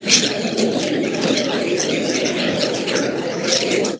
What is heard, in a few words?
crowd